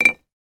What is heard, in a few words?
biscuit cookie drop onto plate